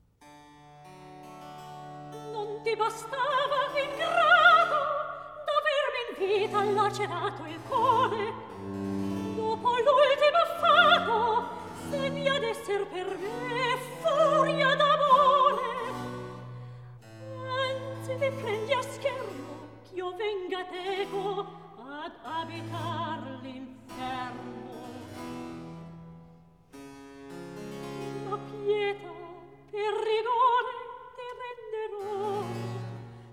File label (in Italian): Recitativo; Delirio amoroso HWV 99; Non ti bastava ingrato; Handel Masterworks; Klassika